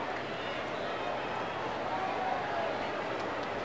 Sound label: crowd, soccer; jb engine